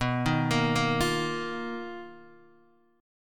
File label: B-Major Sharp 11th B down Guitar Open D